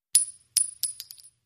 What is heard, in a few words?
Shell Case Sngle Tube, shell casing movement